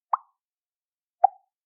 Water Drop

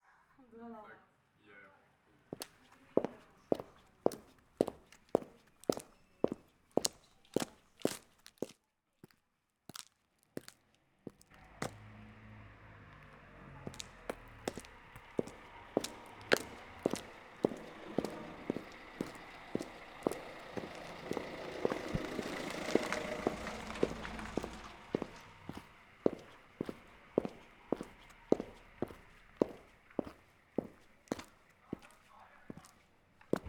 Footsteps Heels Pavement; Denoised

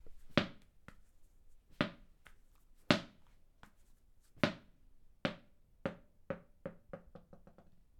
Bouncing Tennis Ball

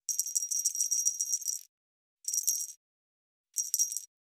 Bell Jingle

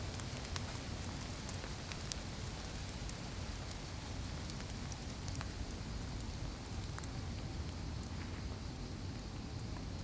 Woods Falling Leaves

